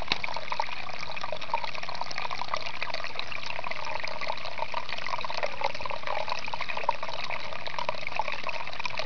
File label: water sound